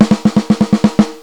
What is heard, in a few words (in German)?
Takt